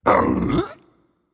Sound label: confused, voicepack; smite; jorm